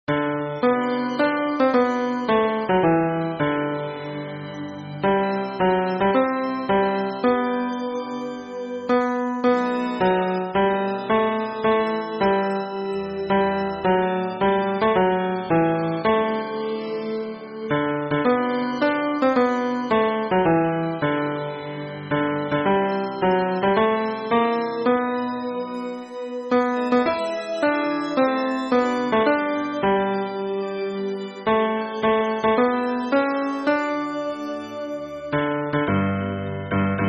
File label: hymn bass; 末日聖徒イエス・キリスト教会